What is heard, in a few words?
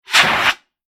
Emergency Fire Brigade Fire Extinguisher Foam Medium Single Blast Squirt Flame Burn; Machinery